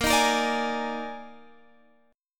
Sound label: Eb Major 7th Suspended 4th Bb